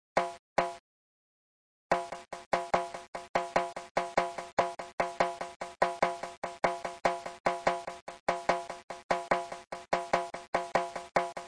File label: funk